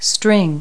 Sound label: string; Multi-Lingual Talking Picture Dictionary